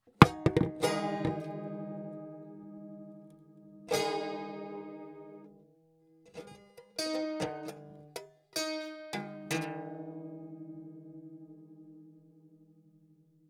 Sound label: Falling Broken Mandoline